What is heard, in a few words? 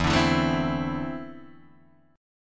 E 7th Suspended 4th Sharp 5th Guitar Open D